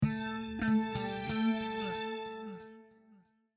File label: japenese mana intro; jocly